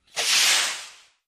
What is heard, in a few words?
Rocket Flare Gun: Firing Into The Sky. Stereo; small rockets